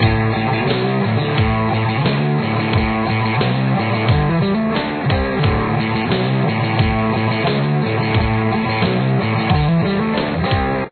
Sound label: outro